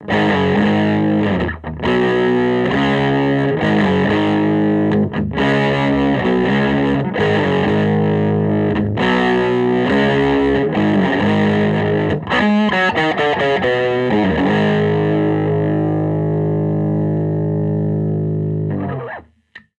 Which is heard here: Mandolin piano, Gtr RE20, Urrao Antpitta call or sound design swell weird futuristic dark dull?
Gtr RE20